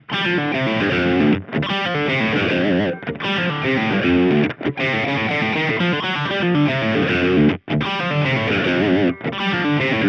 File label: tele bridge boost